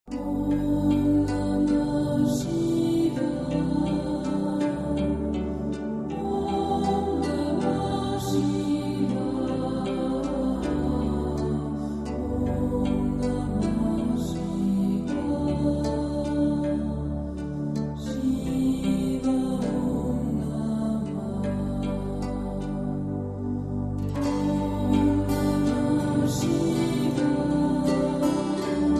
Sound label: Om namaha Shivaya